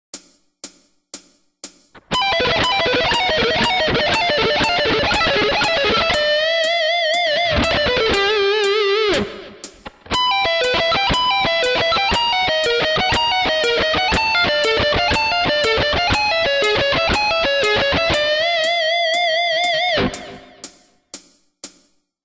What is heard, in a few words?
shred, guitar